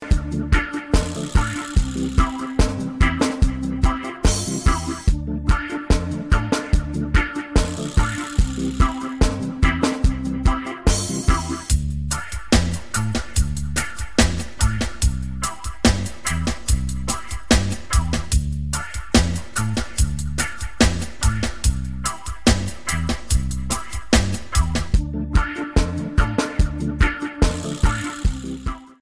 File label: relax ringtone